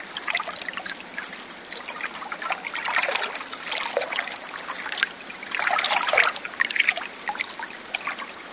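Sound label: ambience water pool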